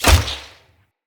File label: Hunter Bow Ranged Shot; Weapons; Player; Envoy; Bows